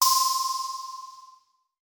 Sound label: Start Open; Puddle Plus